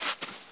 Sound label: Beach Forest slide